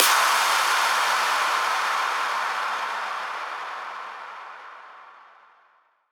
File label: DIRTY downlifter impact